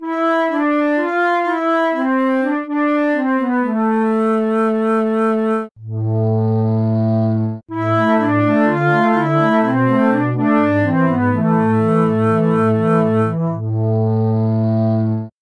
flute

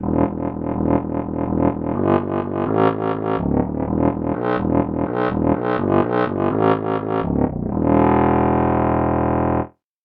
trombone bass

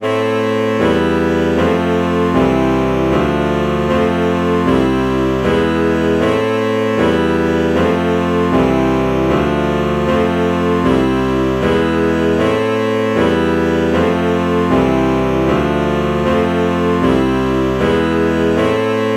alto saxophone alto saxophone chord morph

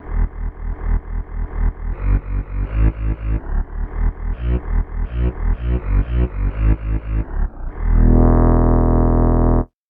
harmonica bass

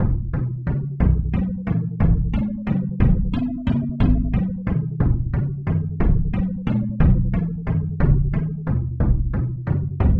taiko drum

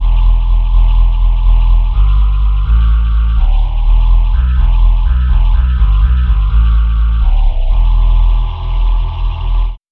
space voice bass

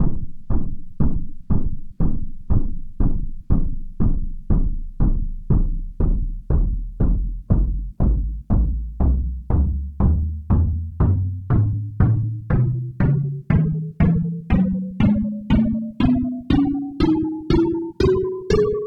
taiko drum; tonleiter

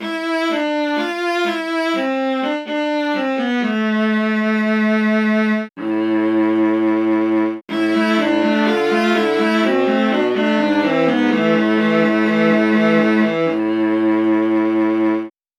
viola

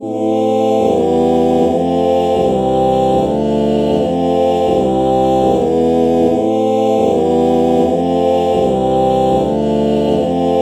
oh oh chord morph

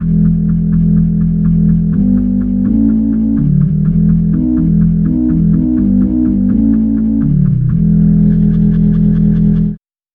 oboe bass

